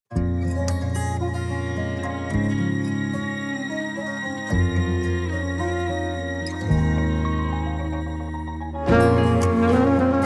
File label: Karaoke, 110BPM; BasserMusic Studios